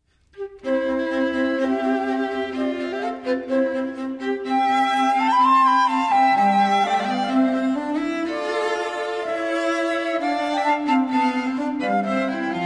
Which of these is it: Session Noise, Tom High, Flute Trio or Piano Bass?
Flute Trio